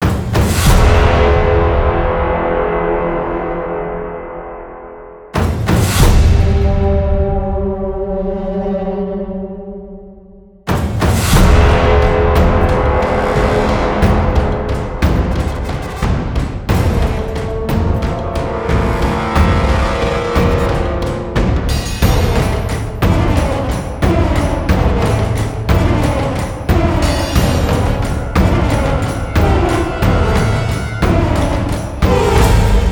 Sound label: Battlefield Drum; DNF Duel; Who's Next